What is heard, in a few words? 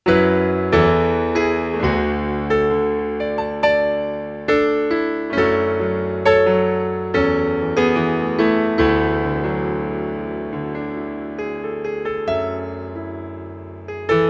piano